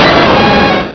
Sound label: Cry; infinitefusion